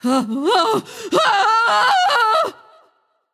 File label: fear; smite; voicepack; medusa